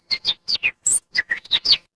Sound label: animal bird